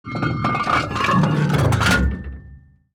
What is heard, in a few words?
gun reload; World of Tanks